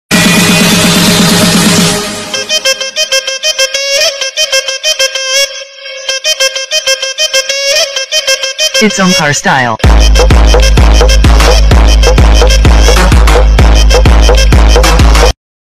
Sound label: new mobile ringtone new love ringtone new hindi song ringtone